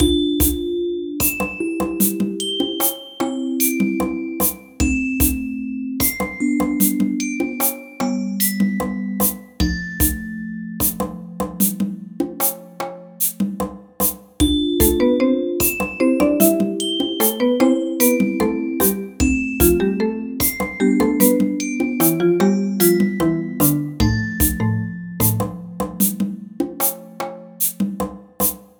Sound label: Mood Music